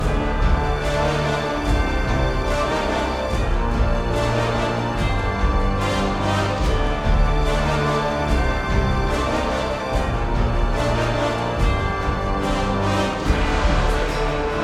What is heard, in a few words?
Boss Fight Loop; Hell Warders